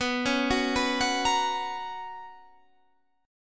B Major 7th Suspended 2nd Guitar Half Step Down, chord